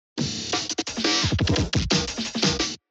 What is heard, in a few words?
ahmen break